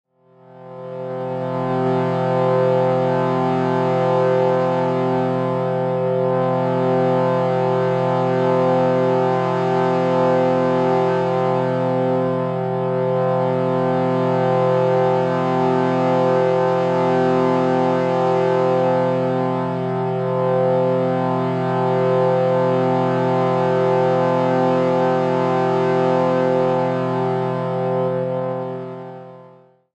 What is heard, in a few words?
Shruti Box C F C 432Hz